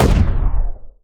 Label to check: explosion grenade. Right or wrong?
Right